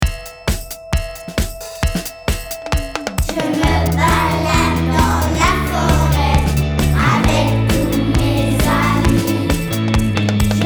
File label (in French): ballade forestiere